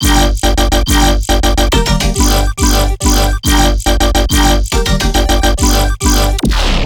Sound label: Melodic Bass Loop G 140; Loops; Glitter; Ghosthack - Color Bass Essentials